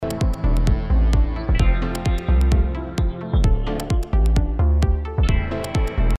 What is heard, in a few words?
Holiday Beats